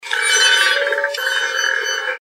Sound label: metallic squeak